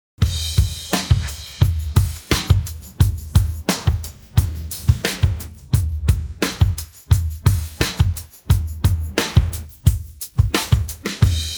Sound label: pattern, drums